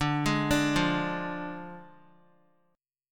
D Diminished, Guitar; arpeggiated

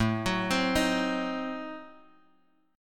A Diminished 7th A down Guitar DADGAD

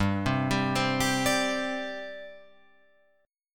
G Suspended 4th G down Guitar Open F; Gsus4 arpeggiated; JGuitar

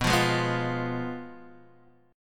F Diminished B

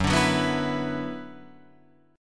Gb 7th Suspended 4th Sharp 5th Gb Guitar Drop D

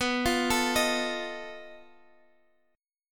B 7th Flat 5th B down Guitar DADGAD

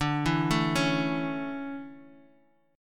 D Major 7th Suspended 2nd Guitar; arpeggiated

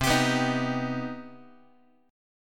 C Minor Major 7th, Guitar; strummed; 4A,4E,5A,5D,5G#,6C#; x,8,10,9,7,x; JGuitar

